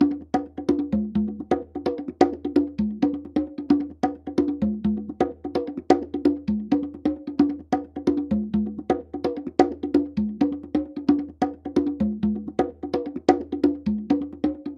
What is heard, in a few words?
Conga 130 BPM